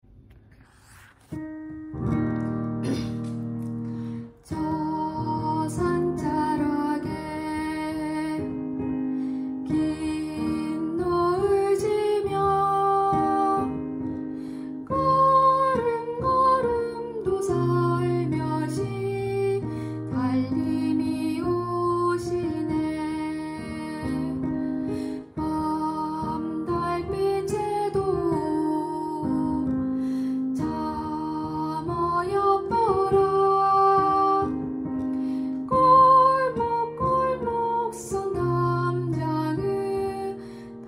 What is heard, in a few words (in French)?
alto, en Coréen